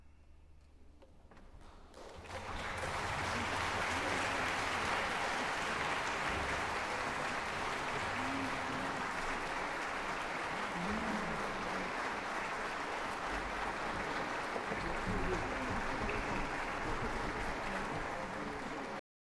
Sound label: Applause